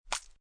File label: Spitting/Horking, short, lip spit, (human voice); Spit Short3; VoiceBox